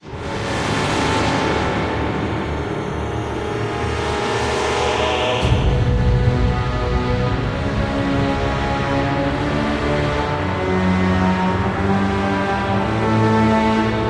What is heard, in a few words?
Death Music; Hero Siege; Android